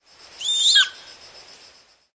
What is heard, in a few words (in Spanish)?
Sonido emitido por un Águila Perdicera